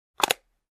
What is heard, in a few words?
Case Close Click